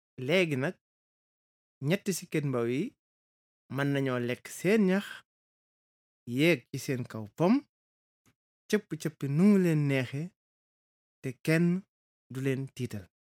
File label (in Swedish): bip